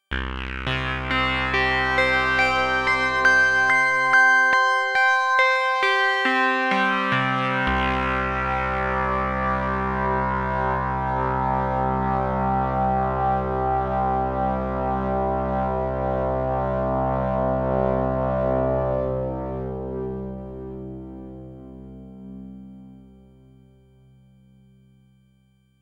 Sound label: Modal 008 VCF FM Sound